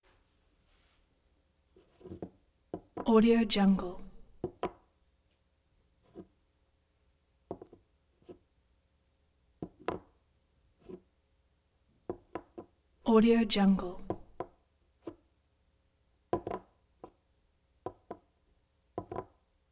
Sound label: set jar on table